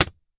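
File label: Dictionary Slam Close, books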